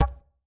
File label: cool percs bad